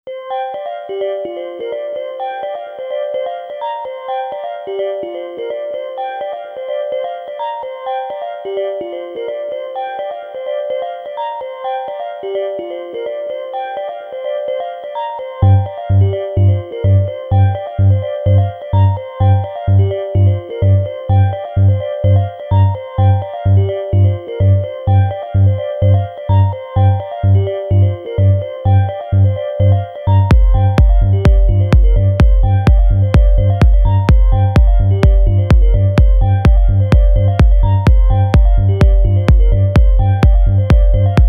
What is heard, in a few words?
20 Hz remix